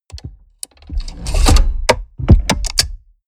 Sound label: mechanics large lock; industrie-wirtschaft; mechanik